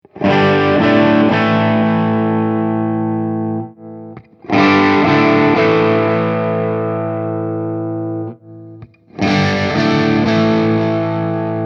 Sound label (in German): diverse cabs